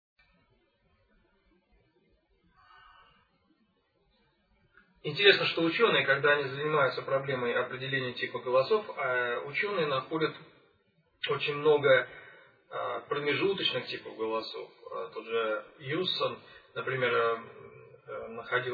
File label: vocal technique diversity voices; Часть 1. О многообразии типов голосов